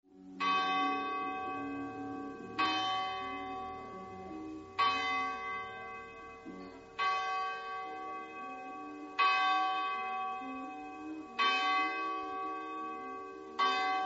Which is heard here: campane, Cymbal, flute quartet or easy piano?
campane